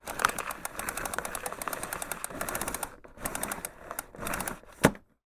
household vacuum cleaner retracting mains cable extend